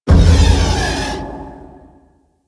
Reactor Piston Move; Ambience; Props; Warframe; Orokin

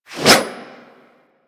Axe Small Swing; Warframe; Weapons

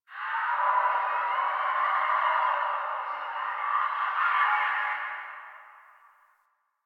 Distant Infested Screams Winter; Warframe; Ambience; Nineteen Ninety Nine

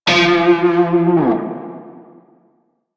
Shawzin Flourish Oct Two F; Music; Warframe; Narmer Shawzin

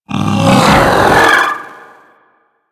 Pet Vocal Pain; Enemies; Vocals; Warframe; Alad V Pet

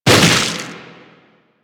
Infested arm attack hit; Enemies; Warframe; InfestedNinetyNine

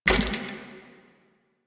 Archwing Scythe mech; Melee; Warframe; Weapons; Exalted Archwing Scythe

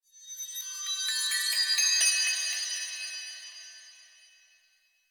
Chimes; Warframe; The New War; Narmer Outpost

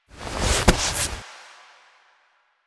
hand plant foley; characters; generic foley; Warframe

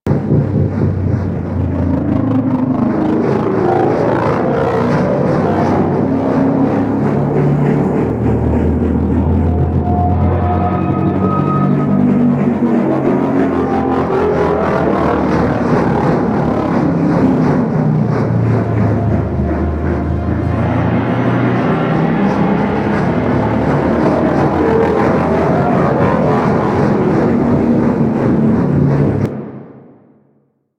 Crew Ship Rotation Speed Loop, Engines; Warframe; Vehicles; RailJack; Crew Ships; Grineer Crew Ship

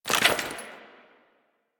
Generic Foley; Warframe; Warframes; Dagath

